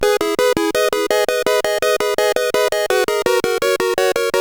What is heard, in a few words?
Remix, CSG8580R5; After Earth Remix